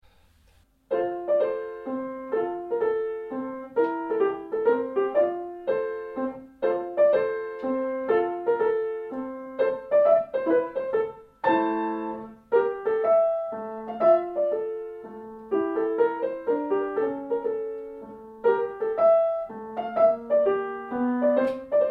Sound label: Mazurka